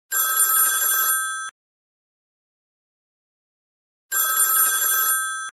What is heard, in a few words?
Old Phone